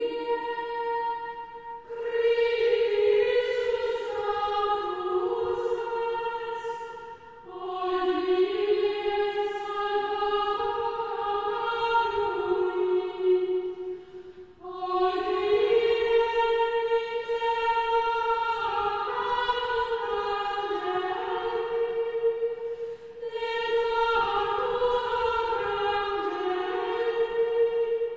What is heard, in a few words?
Chant Antiphon; Traditional; Chester Processional